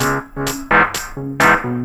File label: LOOP, Techno Trance; Music Loops